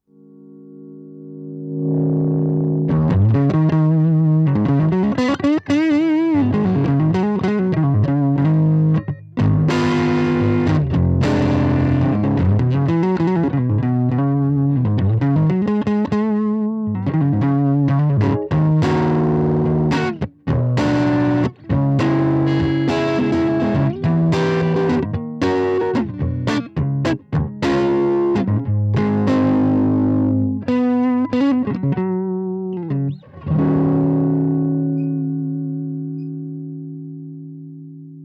strat clean

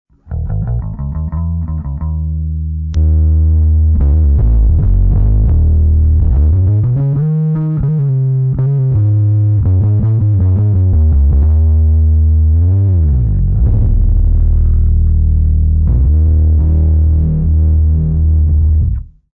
darkbass

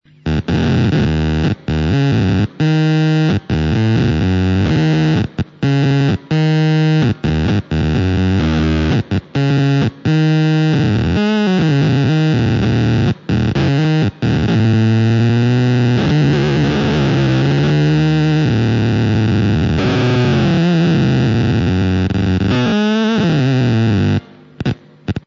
danosynth

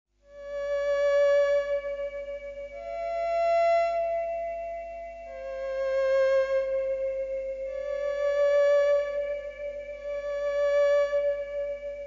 Osc 3 string

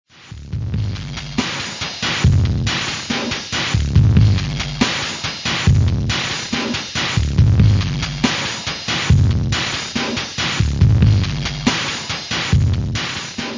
drumloop

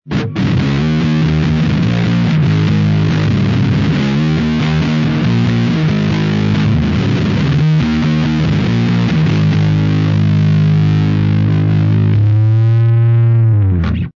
olga guitar

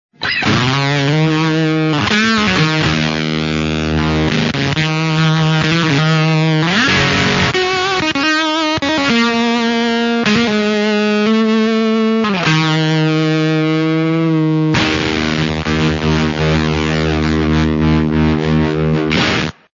sputterbug sputter

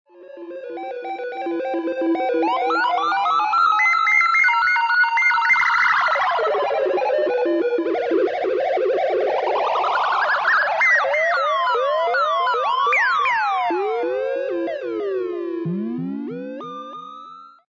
LFO osc

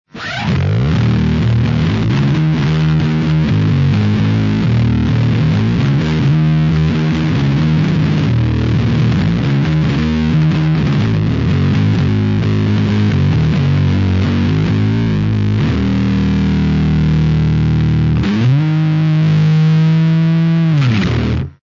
fuzzbass

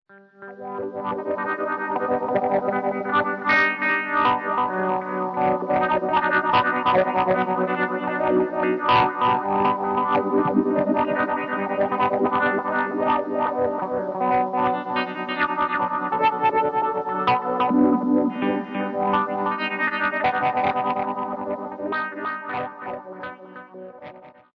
LFO git

